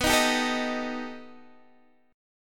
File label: B Augmented 7th B down Guitar DADGAD